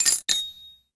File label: Pass Lock